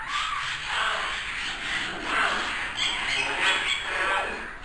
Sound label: Birds